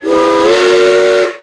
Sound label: Steam Whistle Short, Trains